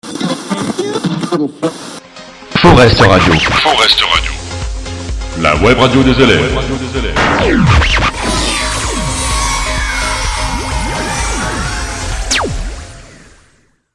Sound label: jingle forestradio; forestradio la web radio des 4C